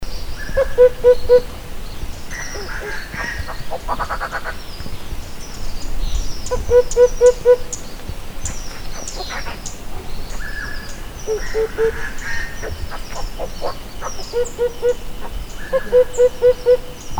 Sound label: Himalayan cuckoo; پرنده کوکوی هیمالیایی